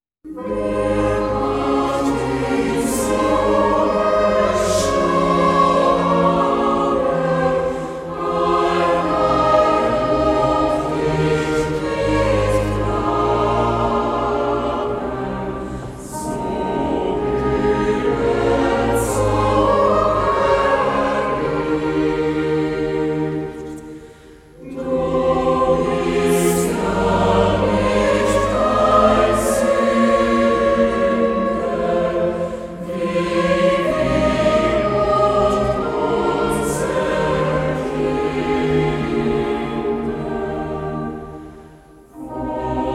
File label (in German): Choral; Wer hat dich so geschlagen